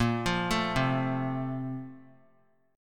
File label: A sharp Major 7th Suspended 2nd; A#M7sus2; arpeggiated; JGuitar